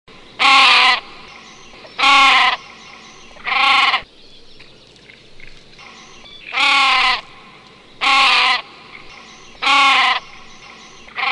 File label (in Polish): Ptaki - Perkoz dwuczuby